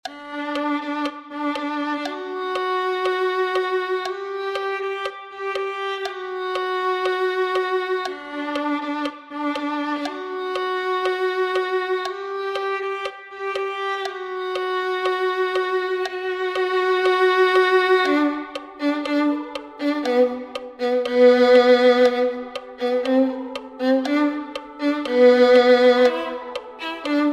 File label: Violin 2